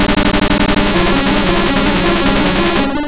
Psybeam Direct; Pokemon; Gen 2; attack moves; GSC

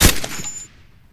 GROZA

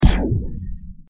Ray; yet another pixel dungeon android

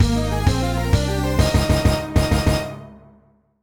Notify; tennis no oujisama 2005 crystal drive ds gamerip 2004

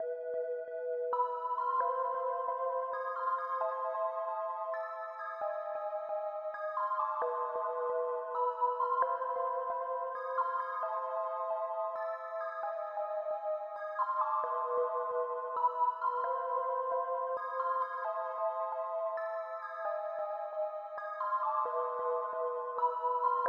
music level

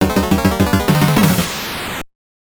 Battle Intro; Cyber Knight; turbografx 16

